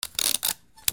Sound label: HOUSE